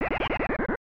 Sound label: Unused Jingle; Super Double Yakuman; SNES